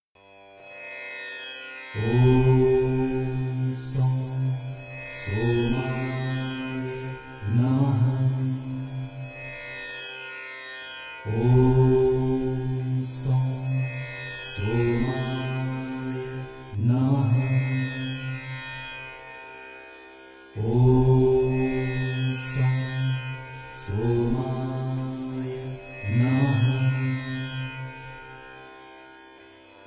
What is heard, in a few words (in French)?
extrait mantra salut lune